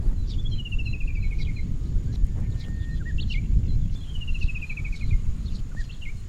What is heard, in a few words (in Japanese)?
ウグイス さえずり 「アカショウビン」 似